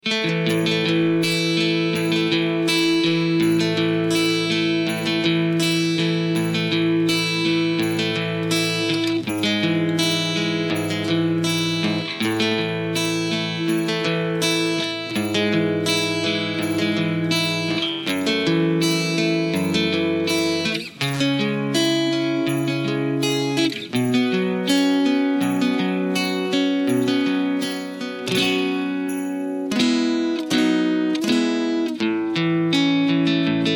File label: (E) No Lead Guitar No Backing Vocals